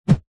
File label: metal crowbar swing in air whoosh; foley